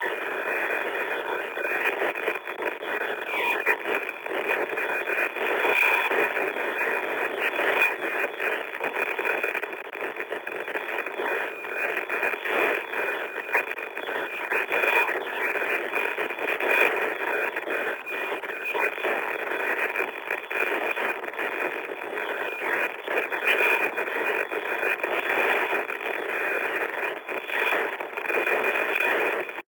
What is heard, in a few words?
Radio Interference Artificial Crashes Signal Failure